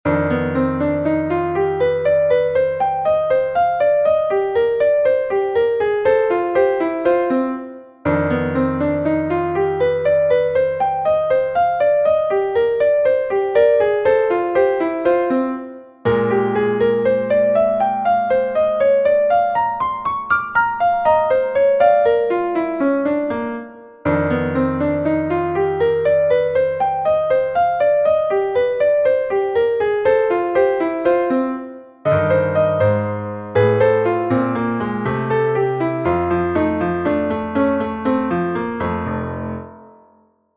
Jazz Exercise

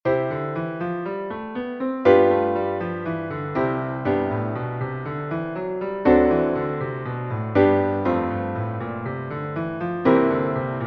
Major Scales Left Hand Drill; Major Scales 1a: Left-Hand Drill

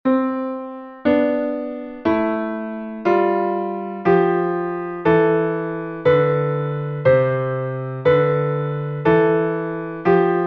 C-Major Scale - Contrary Motion Exercises, Piano

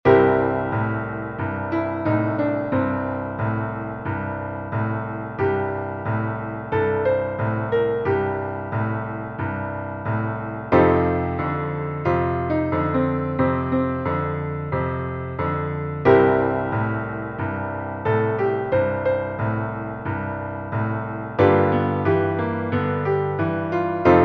Blues Scale Improvisation, Piano

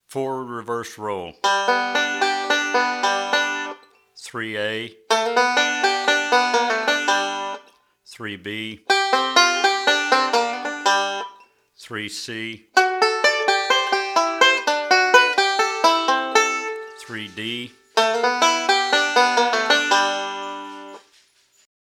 Forward Backward Roll

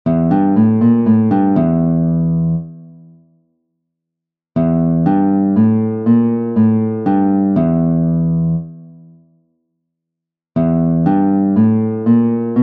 Scale Fragments For Guitar (6th String); Scale Fragments For Guitar 1 (6th String); Scales

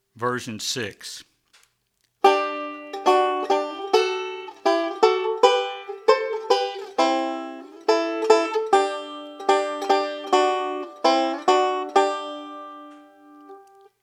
Using Thirds And Sixths

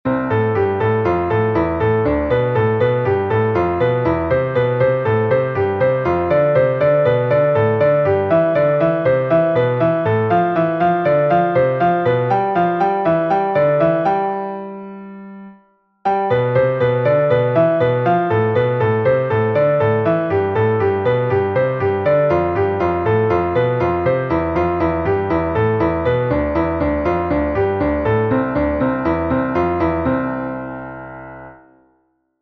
Warm-Up, Piano; Hanon

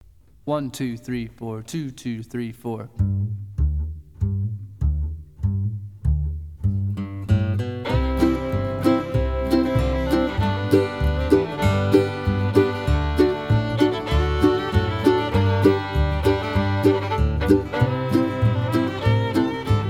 Backup

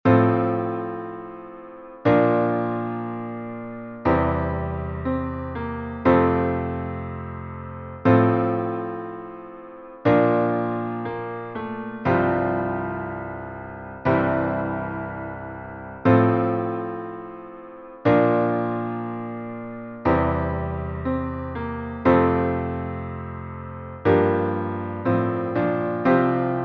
Voicings

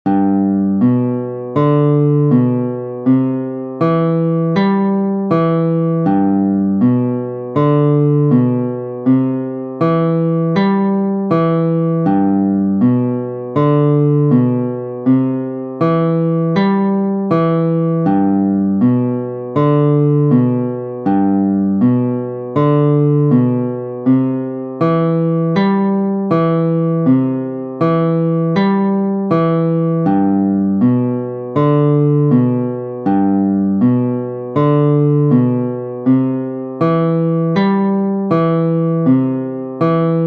G C Chord Formation Exercise, Guitar; Sound Prisms Guitar

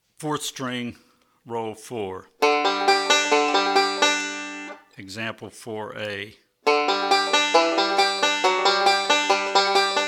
Rolls For Melody On 4th String

